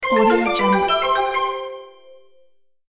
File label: happy shiny award